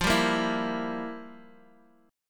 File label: F Major 7th Suspended 2nd Suspended 4th, F, down, Guitar, DADGAD